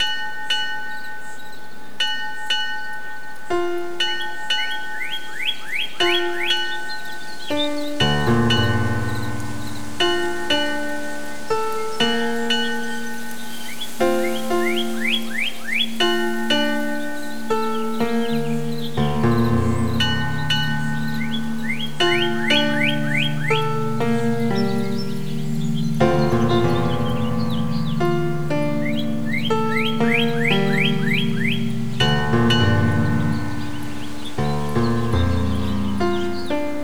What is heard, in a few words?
harbor bells